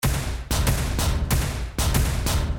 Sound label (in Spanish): golpes